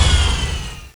laser end; gusanos promode

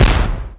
big boom; gusanos promode

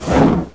flamer end; gusanos promode

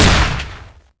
chaingun; gusanos promode

